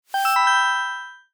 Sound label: sfx reflection star